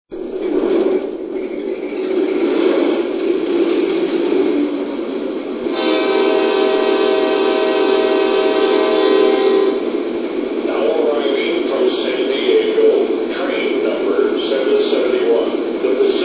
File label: F59PHI, trains